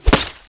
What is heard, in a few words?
Sword; Eft; Weapon